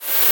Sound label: Bright Rush, Atmospheres; Ableton Foundation FreePacks